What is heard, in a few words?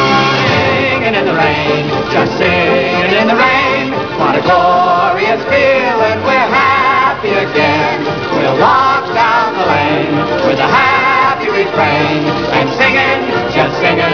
sing